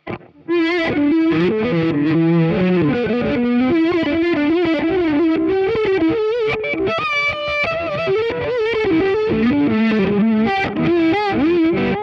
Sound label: reverse, delay; tc electronic nova